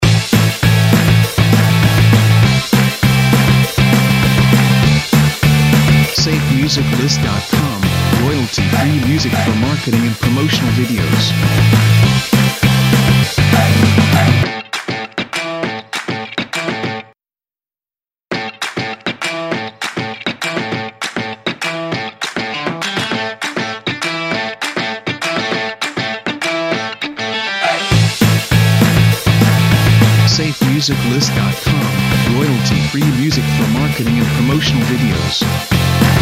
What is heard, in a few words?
Action Sport Indie Rock Intros; SafeMusicList